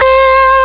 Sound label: gui; Amiga Soundtracker Sample Packs